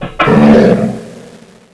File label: attack rocket